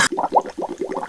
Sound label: pl drown, player